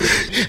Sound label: breath